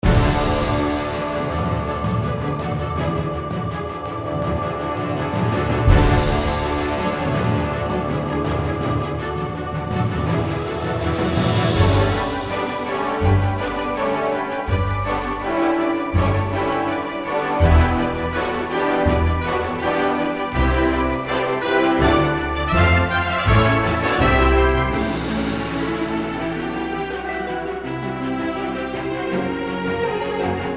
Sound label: Menu; Doodlegod; Doodle God Puzzle Collection